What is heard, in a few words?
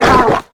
Whistlers VO Hurt; we are the dwarves gamerip 2016